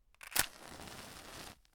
Striking Match

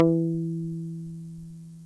Muted Guitar E

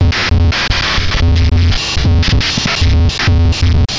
Digital Breakdown

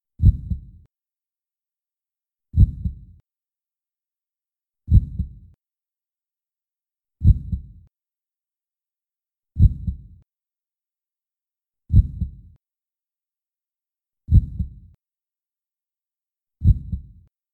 Heart

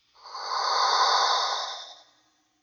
Alien Breath

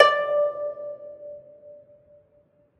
Kayageum D5

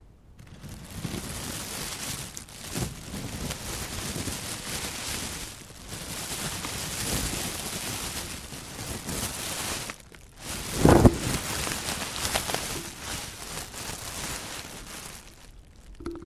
Rustling

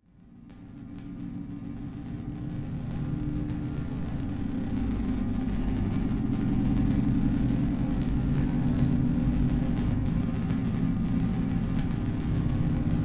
Tesla Buzz

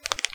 Knuckles Cracking

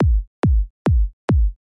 140 Bpm Kick Loop

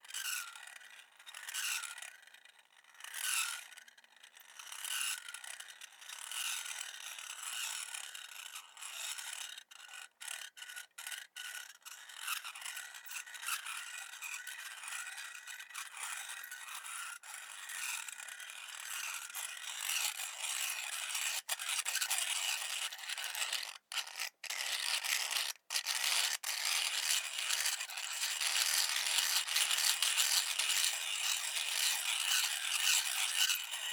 Grinding Metal

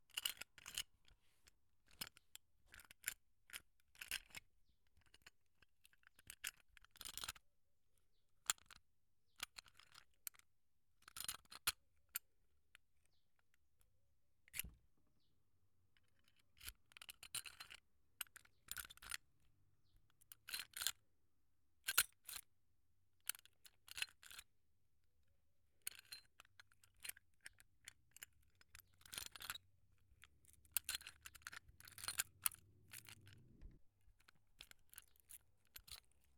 Picking Lock

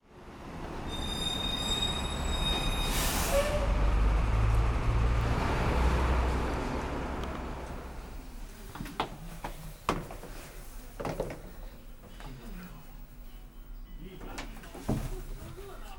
Playing Mahjong At Apartment

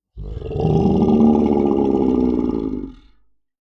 Monster

